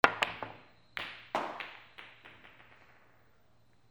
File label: BILLIARD